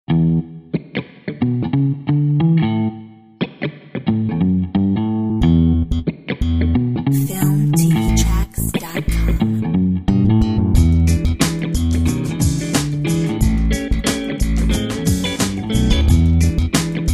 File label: Funky